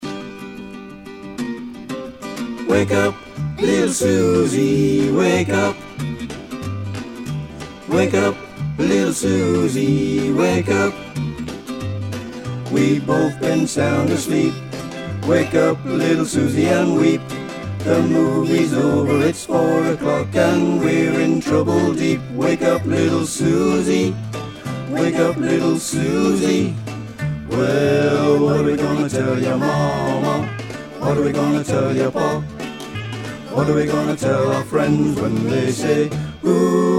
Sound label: Bass; Choir Harmonix; Harmonies